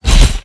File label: Drone Vulcan Attack End; blue archive